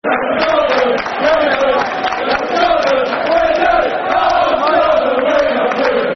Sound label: Football Chants (Soccer Song); We Love You Tottenham; Tottenham Hotspur Thfc